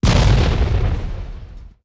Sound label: exp small generic